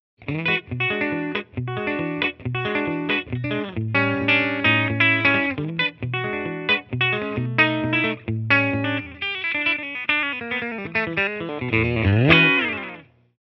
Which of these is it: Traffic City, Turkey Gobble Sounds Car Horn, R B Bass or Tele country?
Tele country